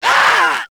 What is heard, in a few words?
kid zombie stun; nmrih; childrenofthedead; zerosiki; slender